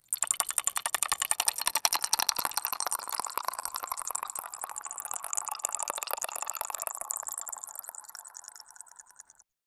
gooey melted chocolate is

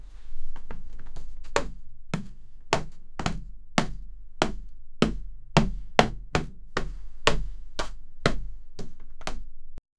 clear player steps

